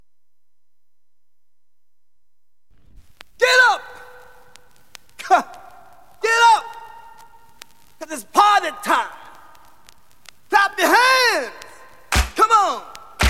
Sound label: funk